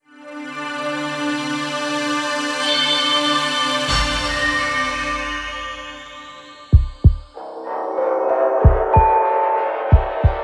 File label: AMBIENT MELODIC FX; Flintpope